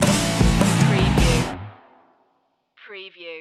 southern fuzz stinger

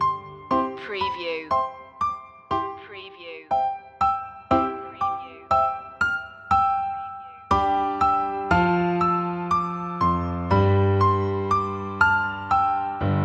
o come all ye faithful piano loop end; media music now

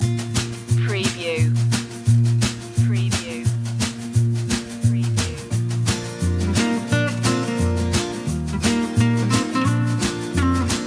no piano

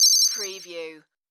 Multimedia Alert

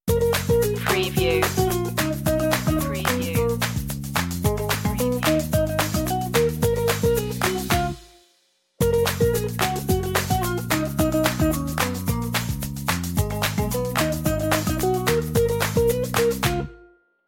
island loop

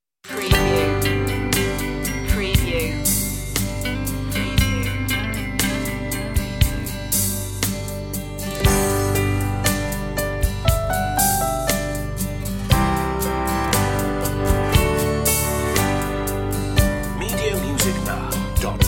bah bah black sheep instr

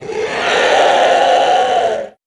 creature vocal

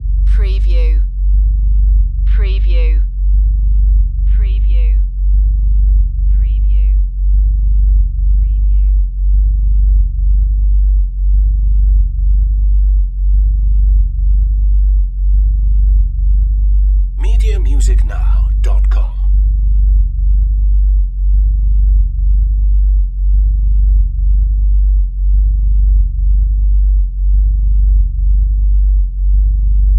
Vibrtn Bass

